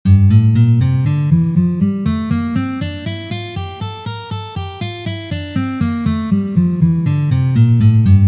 Gamma Re minor naturalnyj vid 2 pozitsiya

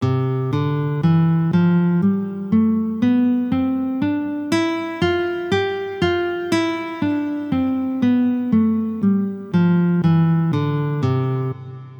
Gamma Do mazhor

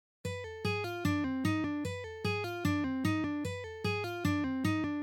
Vertushki na gitare; taby

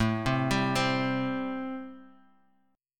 Perebor Am7

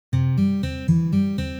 Voshodyashhie patterny

